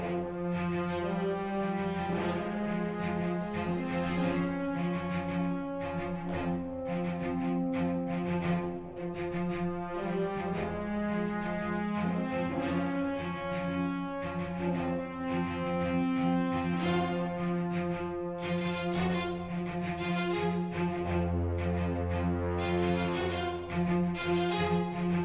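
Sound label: Volcano Enemy Fight; Stonewin Volcano Emeny Fight 2 Stem 1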